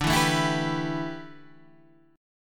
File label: A 9th Suspended 4th, D